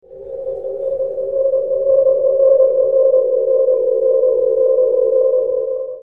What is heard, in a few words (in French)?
calebasse